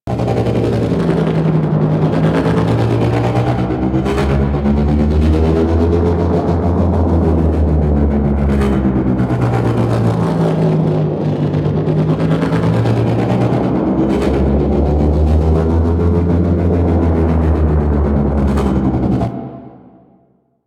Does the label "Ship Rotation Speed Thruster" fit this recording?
Yes